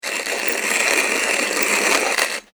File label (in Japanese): コーヒーミルを回す